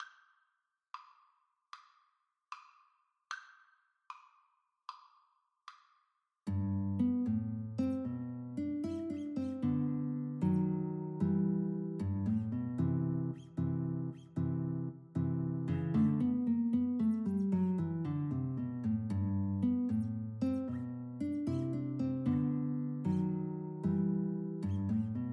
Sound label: duet, Guitar